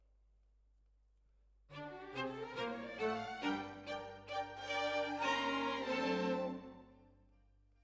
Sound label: Violins, jazz for piano